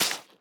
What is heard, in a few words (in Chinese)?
湿海绵 踩踏声